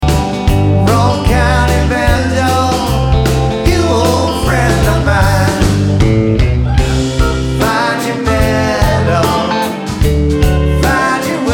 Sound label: Banjo